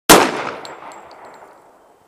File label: 40 caliber pistol shot